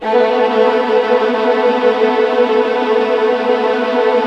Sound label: WH TRL A3, Violin; Q Up Arts; Denny Jaeger Master Violin Library; PERFORMANCE